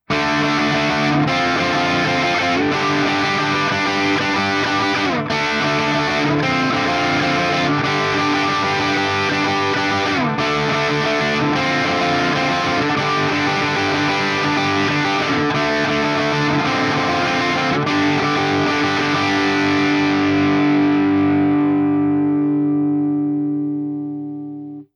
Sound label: High Gain Marshall JCM 800